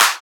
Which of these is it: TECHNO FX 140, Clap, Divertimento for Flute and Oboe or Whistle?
Clap